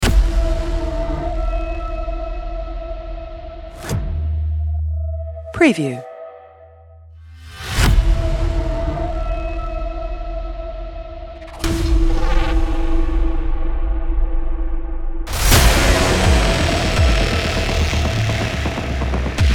Hybrid Action Countdown Trailer Drums

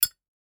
Lighter Open

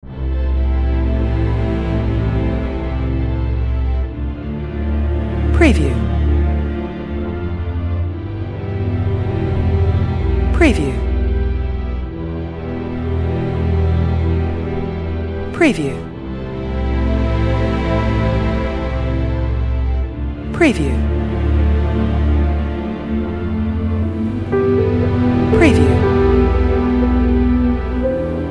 Cinematic Emotional Music Sad Cello Piano

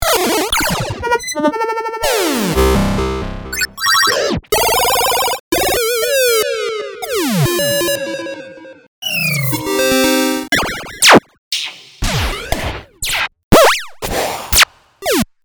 8 Bit Sounds

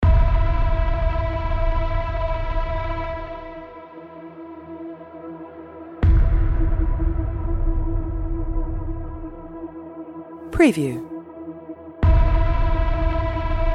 Cinematic Fast Action Hybrid Drums Trailer Music

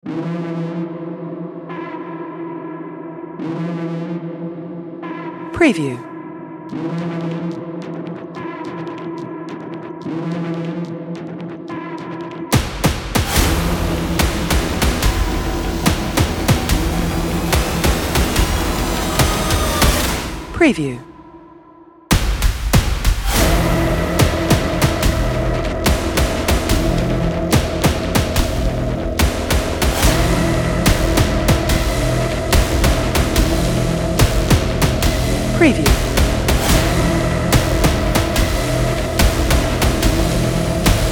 Warning Signal

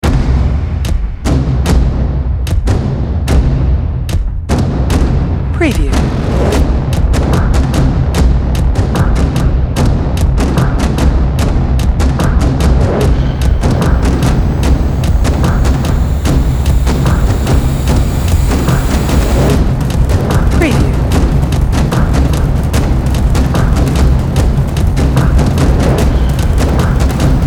Cinematic Rhythmic Action Drums

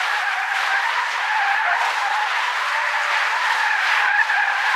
Tire Screeching Asphalt

